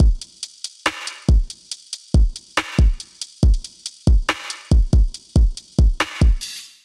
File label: Seclude Kit 70 bpm, Trap Drums; Trap Drums by Sound Oracle; Ableton; Factory Packs